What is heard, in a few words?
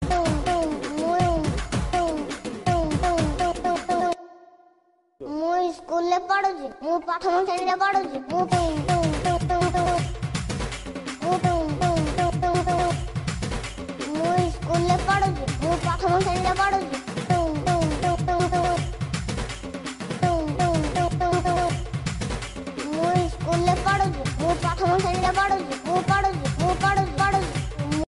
Odia Viral Song Ringtone